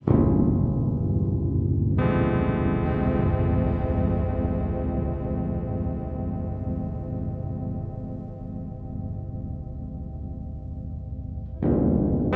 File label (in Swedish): spelgladje for ostamd gitarr